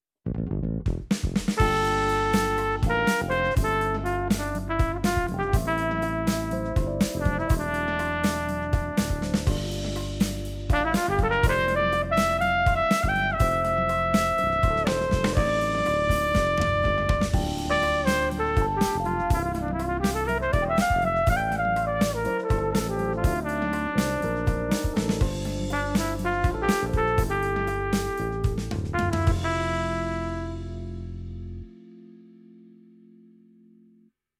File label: Trumpet Tune